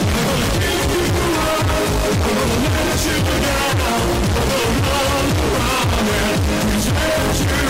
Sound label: bass boosted